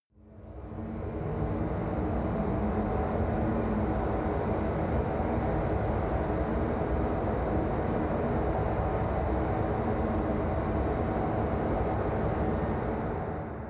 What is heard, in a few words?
machine room